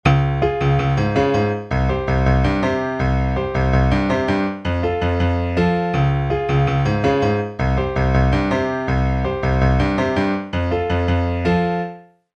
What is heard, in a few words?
happy pattern